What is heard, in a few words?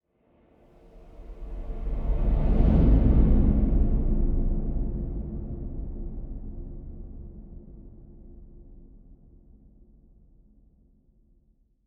Low Swoosh